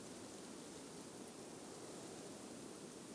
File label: reel Spin; imperialriches